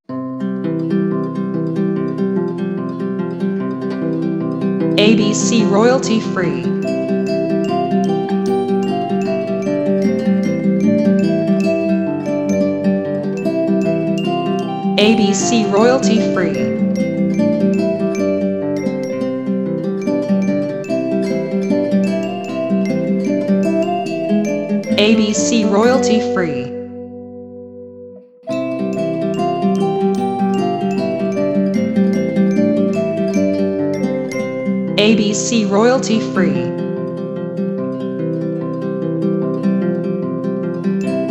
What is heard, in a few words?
Kids Doing Ode to Joy